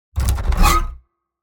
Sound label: Double bass and wires designed hit